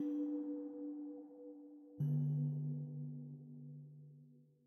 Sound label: Music Citadel Bells; Frozen Flame